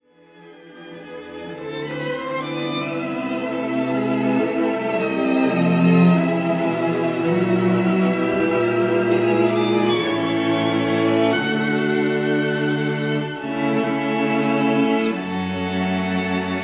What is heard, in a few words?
strings; analyser samples